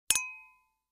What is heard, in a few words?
wine glass clink